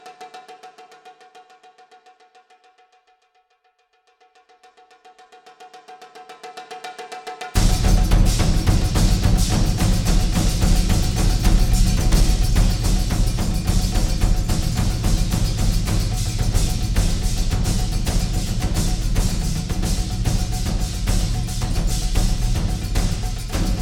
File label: Tape Percussion Japan, large japanese drums; world of heavy percussion